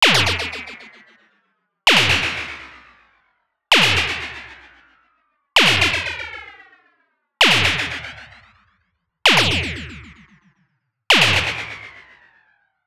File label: Superkick Fx